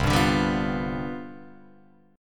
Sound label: C Major 7th Suspended 2nd Suspended 4th C down Guitar Open F, chord; CM7sus2sus4 strummed; JGuitar